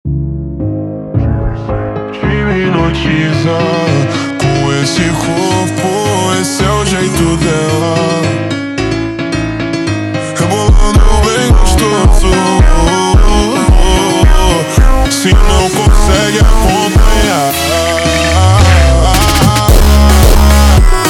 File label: Montagem slowed